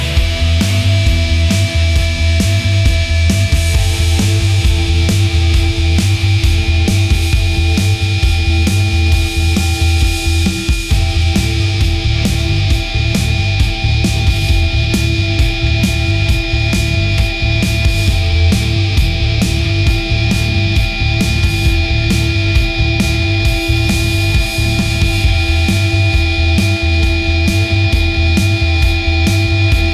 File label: electric drive; ai-generated